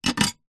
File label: animals fish tank aquarium glass top set on rest on plastic brackets